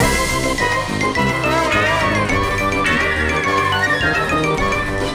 Hallway; The Act; Arcade, iOS, MacOS, Mobile

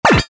object button touch all

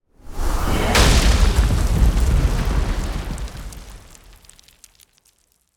Magic Rock Explode; NPC Mago; Josh Journey Darkness Totems; PS4, Switch, Windows, Xbox One gamerip

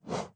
Ui Change Selection

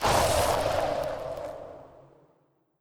Ice; Naga Ice1

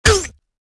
Cleric M Damaged

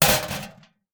impact trash box

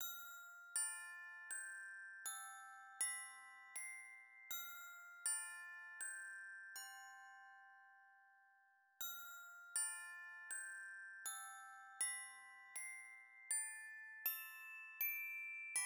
Shade Music Box

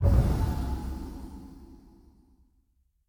Special Click

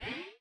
Drill Dig Explosion Warning; enemies

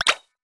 ui academy button no

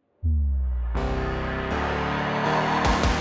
Castle Battle Intro

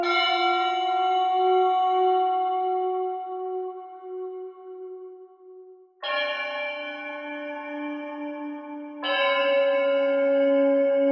LEAD

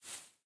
Footstep Foliage Grass

